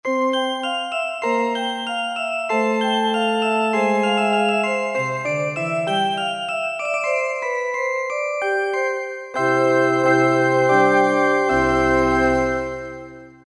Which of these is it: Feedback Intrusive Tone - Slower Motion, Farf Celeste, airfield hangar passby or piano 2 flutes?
Farf Celeste